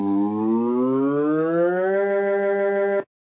slide up, pain pack glitches growls words and tones; Ze Franks Pain Pack